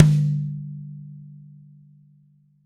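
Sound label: TY High Tom, Roland BK-5 Toms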